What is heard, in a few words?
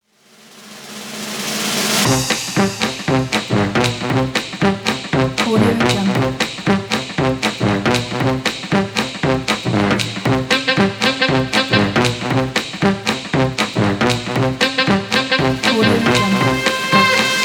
summer mood electro swing